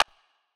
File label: Clap Small Verb